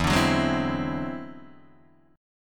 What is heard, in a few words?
E Augmented 9th E down Guitar Open D